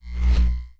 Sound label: energy, magic